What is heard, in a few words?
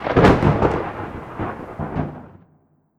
Elemental Thunder